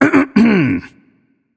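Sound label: nonverbal clear throat; voicepack; smite; merlin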